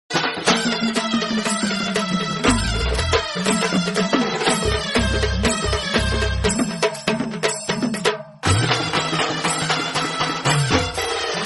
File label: marathi ringtone bgm; subhedar movie